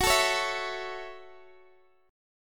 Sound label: Gb Minor 7th Gb Guitar DADGAD; x,x,16,14,16,14; down; chord shape